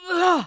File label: damage, Voices; AppleHillsProduction; Quarry; Trafalgar